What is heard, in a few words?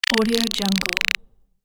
alien insect static